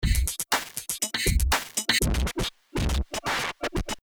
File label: distorted